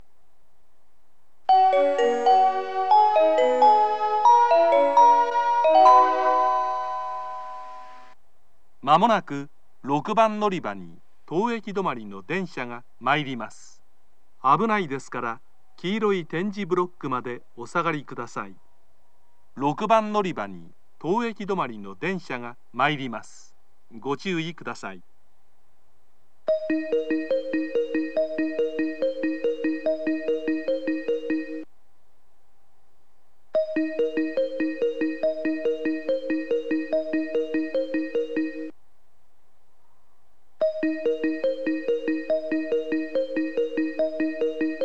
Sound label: stop, kyoto